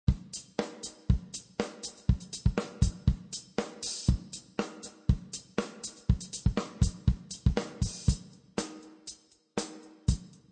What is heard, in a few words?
Drumsticks - Festive Maracas - Green and Blue Maracas - Conga Drums